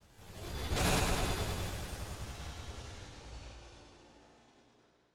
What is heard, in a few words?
explosion